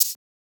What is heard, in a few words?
Shaker; FLPRO